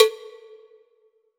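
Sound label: COWBELL, drum machine; Drum Mashines; EdgeSounds; JD DRUMS KIT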